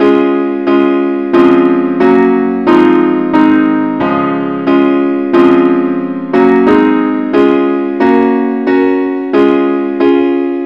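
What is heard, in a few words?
advanced accompaniment, key C sharp; christe Sanctorum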